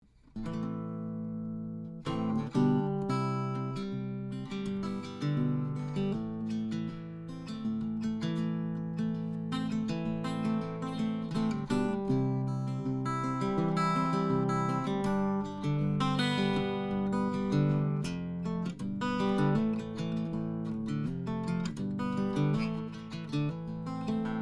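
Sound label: Fingerpickin, Guitars; Harmony Royal Crest; Fingerpicking / (Improv.)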